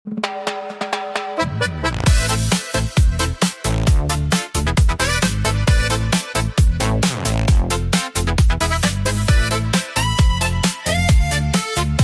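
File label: staryj klavesin, old harpsichord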